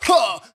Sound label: huh male, Voice